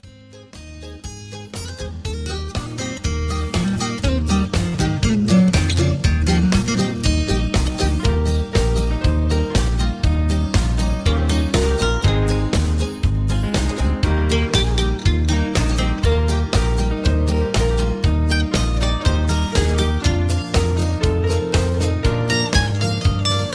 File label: Instrumental Higher Key; Singing Calls